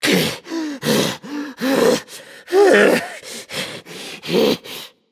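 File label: non-verbal breath; smite; voicepack; jingwei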